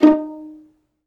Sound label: violin F; ILIO; Synclavier Strings